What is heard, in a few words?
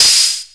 electro, Emu Sp12; Drums